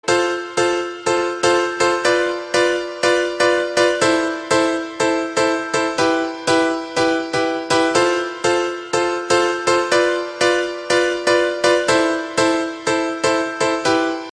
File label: Piano; toque de celular